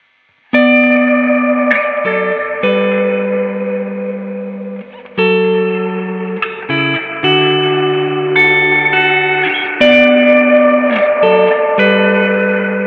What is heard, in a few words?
light pedal optics